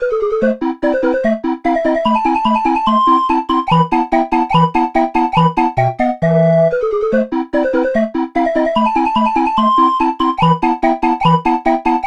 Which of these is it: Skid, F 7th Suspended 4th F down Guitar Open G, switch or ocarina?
ocarina